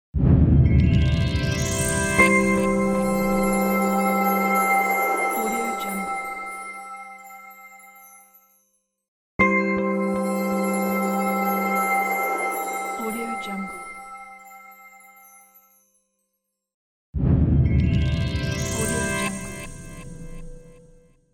shining peaceful logo; tibasound

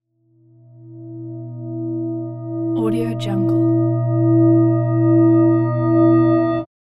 reversed bell swell transition

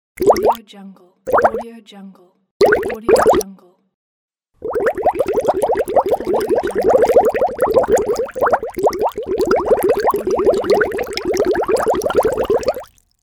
bubbles

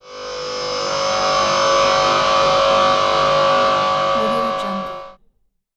voltage transition

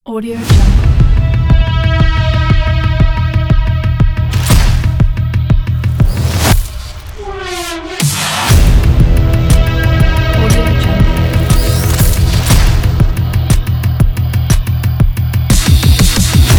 sport action trailer; soundtype

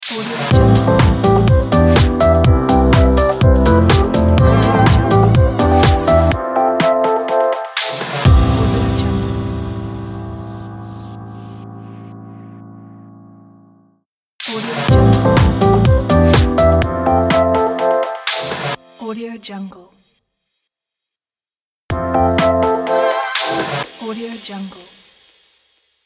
shopping commercial logo

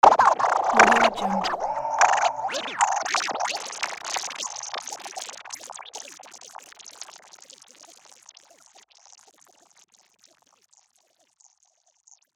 vinyl glitches

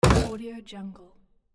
wood board jump

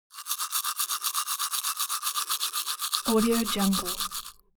foley hygiene teeth brush dry open molars; smartsoundfx

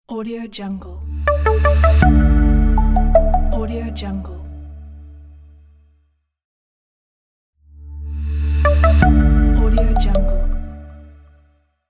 minimal logo

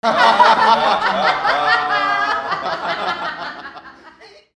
laugh

